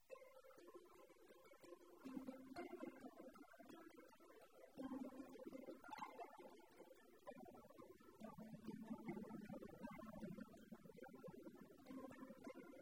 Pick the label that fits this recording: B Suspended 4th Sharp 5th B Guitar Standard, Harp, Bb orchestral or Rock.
Harp